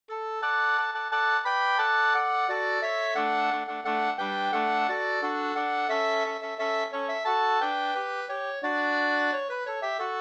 ganglat; Millerste-Kal Sd

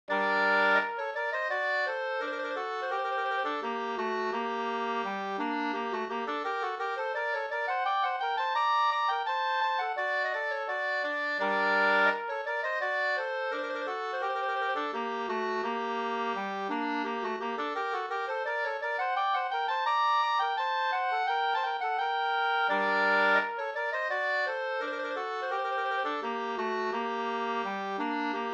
brudmarsch

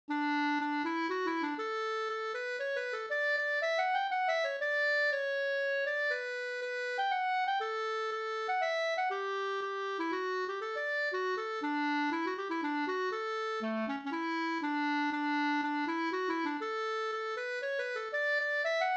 menuett; Melevetten SvL Sk168

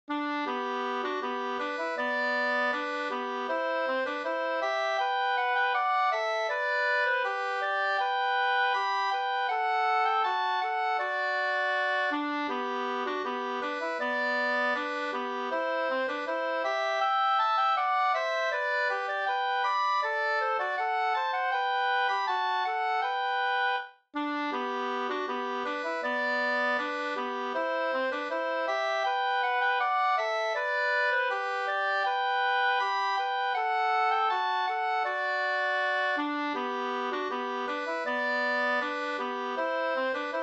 brudmarsch

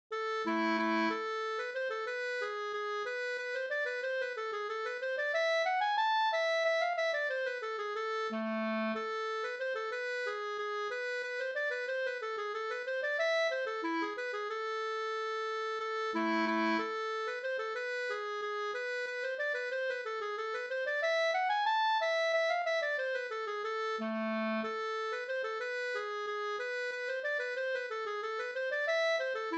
polska A moll

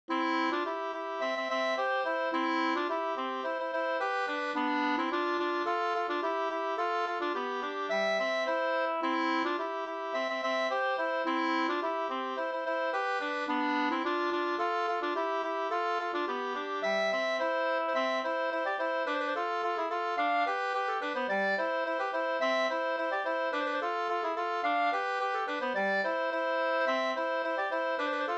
polka, C dur

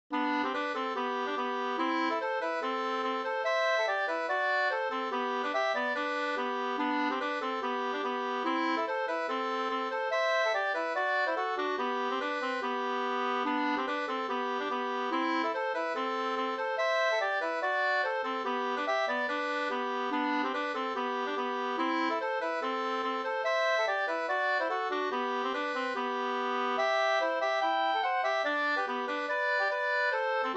schottis; e Blinda Janne Granna Sm 2st V1

